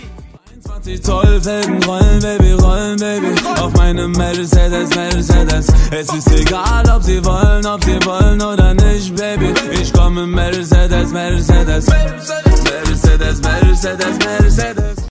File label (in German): deutsche rington